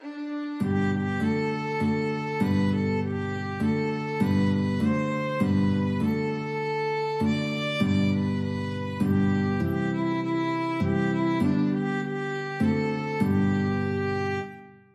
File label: violin guitar duet